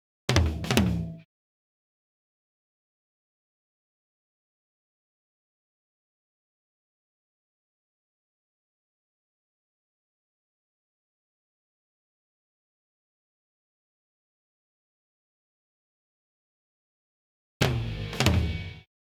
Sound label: drums